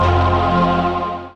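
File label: synth bass choir; Best Service Gigapack 1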